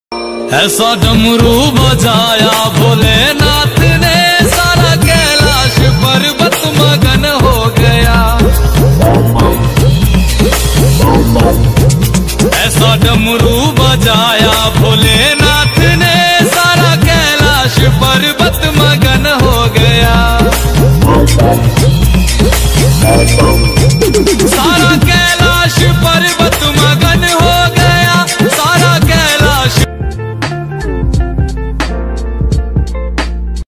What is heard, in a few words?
ringtone mahadev bhakti